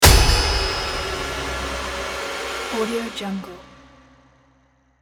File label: horror jump scare